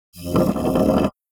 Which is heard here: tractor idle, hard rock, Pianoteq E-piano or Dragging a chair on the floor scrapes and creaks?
Dragging a chair on the floor scrapes and creaks